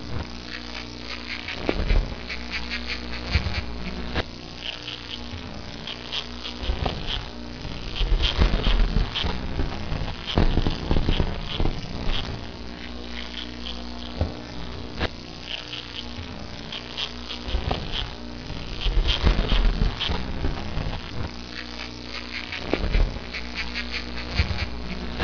Crab Duet

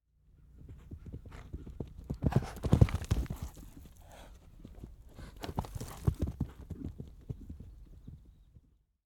Canadian Horse Running

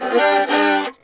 Violin Bit

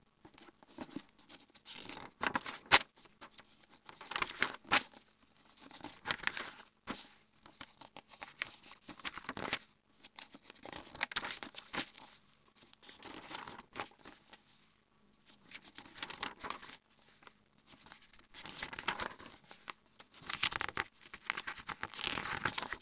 Book Page By Page

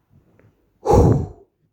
Candle Blow

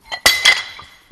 Weights

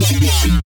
Dubstep Growl

